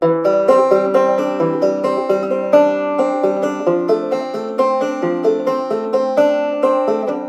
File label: rag advanced use syncopated arpeggios, banjo